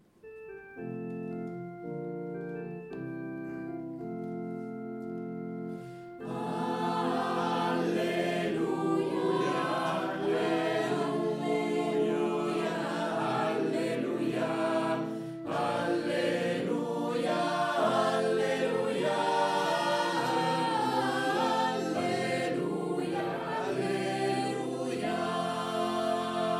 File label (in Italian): Alleluia Passeranno